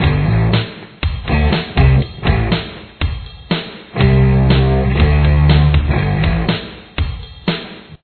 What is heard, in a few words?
space intro